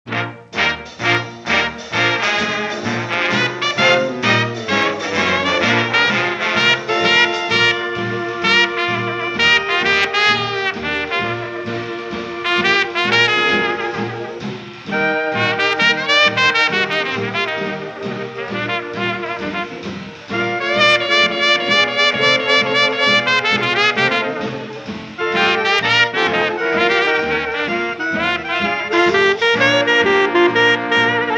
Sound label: Dixieland Jazz Orchestra, Blues; Beale Street